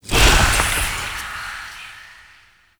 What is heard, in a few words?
Tailor Shop Door Fade; Warframe; Ambience; Nineteen Ninety Nine; Interior